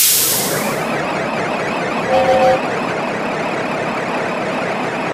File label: teleporter spin; tf